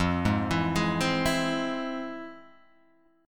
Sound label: F Minor Major 7th, F, Guitar, Open G